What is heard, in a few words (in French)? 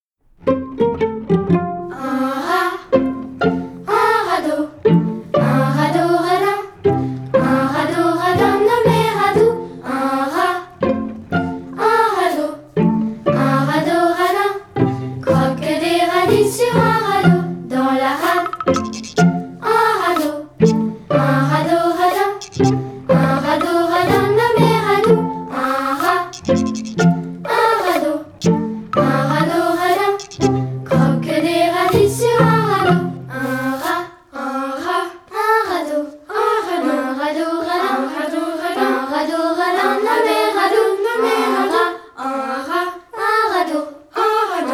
Version vocale; Un rat